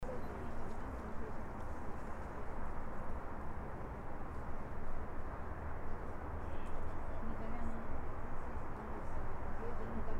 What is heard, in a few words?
Street Crowd Ambience